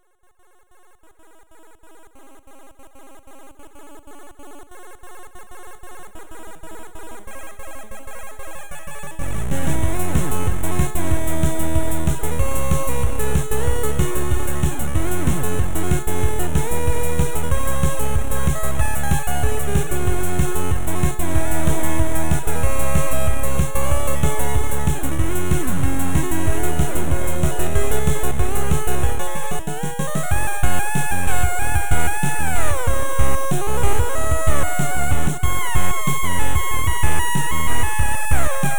Chiptune

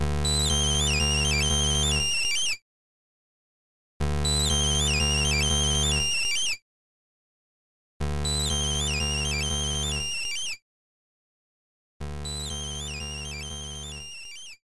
Radio Transmission GB Sounds; Pokémon HeartGold & SoulSilver

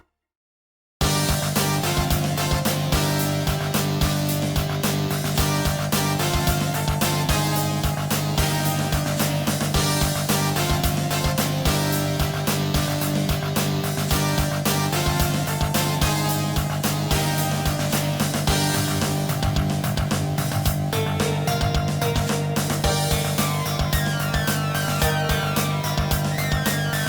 BGM Music; YuGiOh Over the Nexus

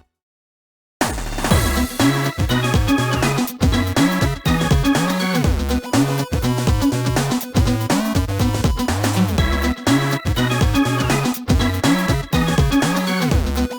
Menu Screen; YuGiOh Over the Nexus

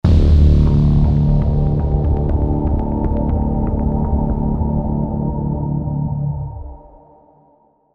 Level Intro Jingle; Death Wish

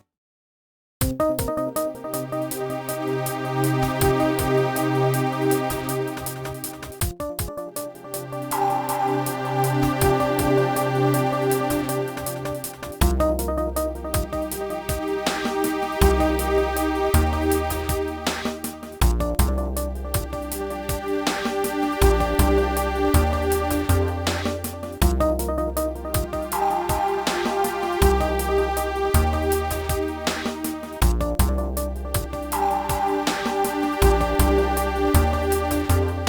BGM Music; YuGiOh Over the Nexus

